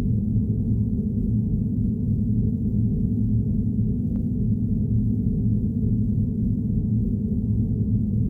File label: escape pod, ambiances